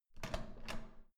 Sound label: door handle use